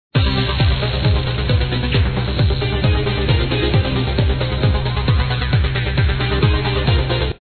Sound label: Combat Music; pencak silat 2002 gamerip online